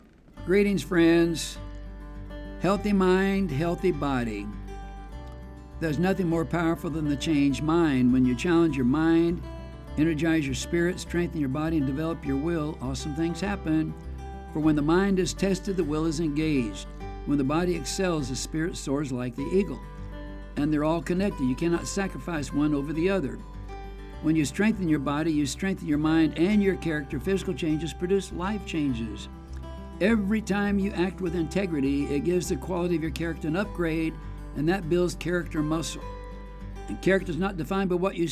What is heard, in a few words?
healthy mind healthy body acoustic breeze